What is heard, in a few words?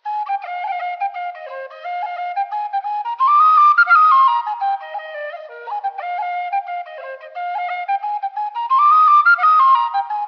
Freeman Tweaked Bb Generation Hornpipe, whistles